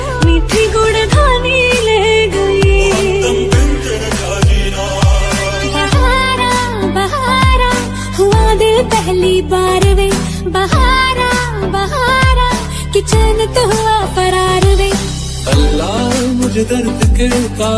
sad song bollywood ringtone; bollywood ringtones

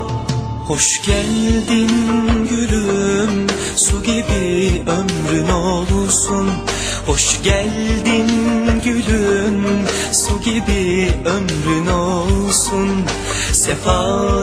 turkish music ringtone

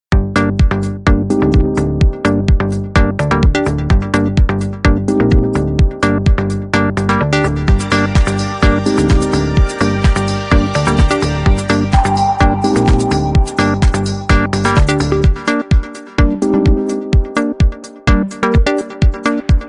nokia lumia ringtone

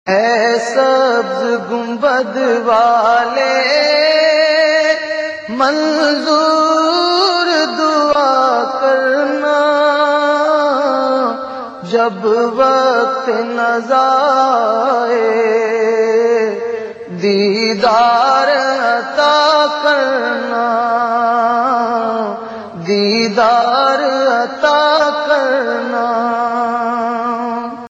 islamic tone ringtone

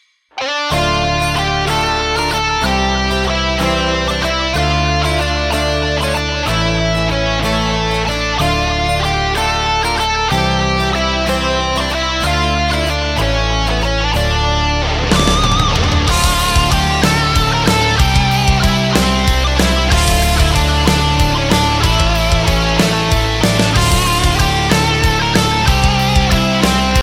heavy metal; greensleeves

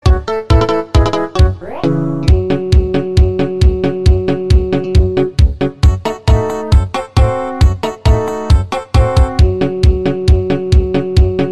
oneplus three light music ringtone

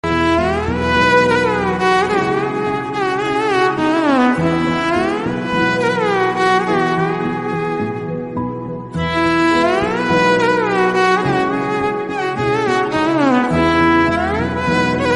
valentines day love music tone ringtone

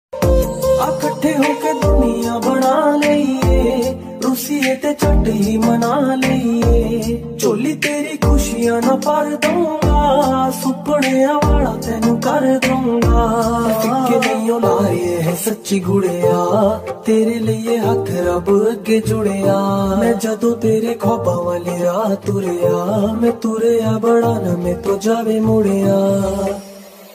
flute bollywood ringtone

